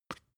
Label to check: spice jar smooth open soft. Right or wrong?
Right